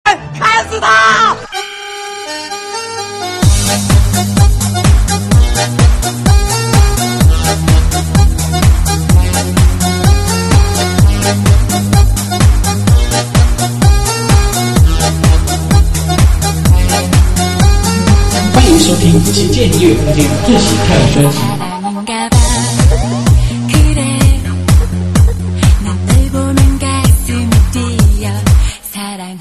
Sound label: DJ